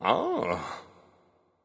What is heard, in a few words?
grunt; Smite; bacchus